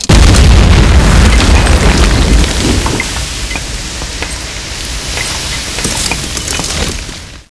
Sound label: c4 explode